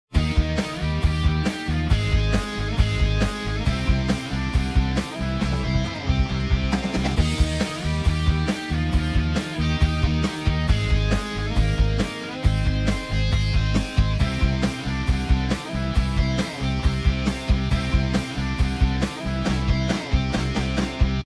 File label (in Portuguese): harmonia bIIi bVI I